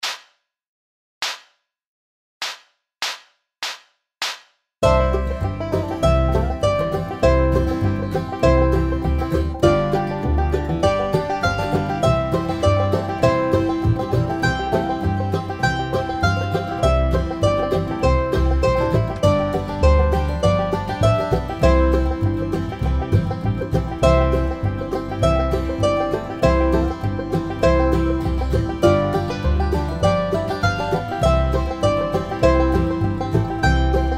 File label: Mandolin